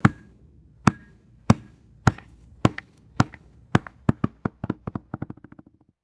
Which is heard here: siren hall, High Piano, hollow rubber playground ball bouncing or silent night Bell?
hollow rubber playground ball bouncing